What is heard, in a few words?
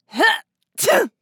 Angry woman sneeze